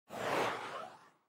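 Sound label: operating system Mac OS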